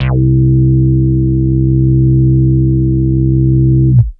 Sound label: Big Synth Basses